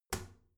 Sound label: toilet lid wall open soft; haus-garten; badezimmer